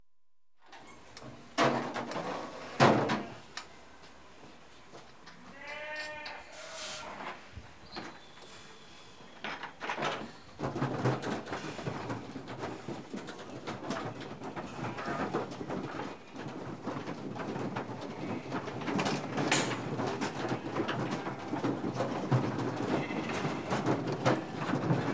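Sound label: Sheep Unloaded At Market - Exterior; Livestock; BBC Sound Effects Library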